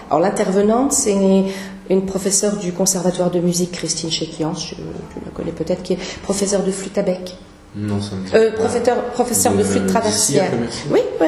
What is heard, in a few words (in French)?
Flute